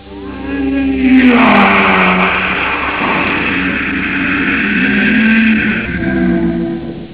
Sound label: groan